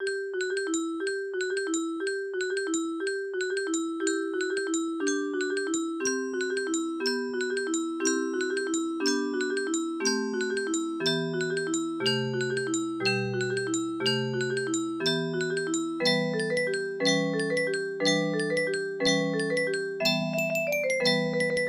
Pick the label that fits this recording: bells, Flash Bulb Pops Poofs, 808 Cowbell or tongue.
bells